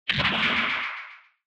Retro Anime Accent Long

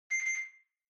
Washer Front Load Beep Far Short

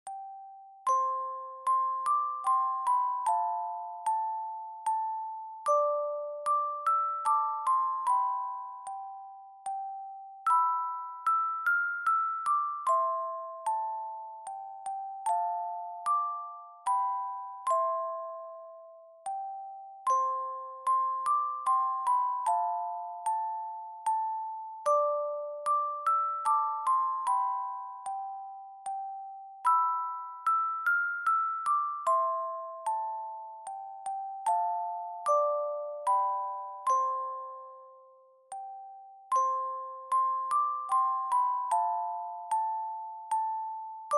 Dry Short Slow; We Wish You A Merry Christmas

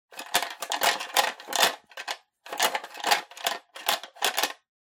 Pencil Case (Shake)

